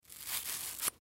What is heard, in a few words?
Food Fruit Apple, Foley Food Fruit Apple Remove Knife Cut; Household; Blastwave